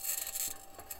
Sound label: mic, mars2020 edlcam